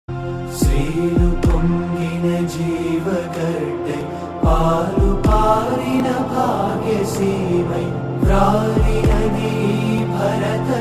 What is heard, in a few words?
Indian Female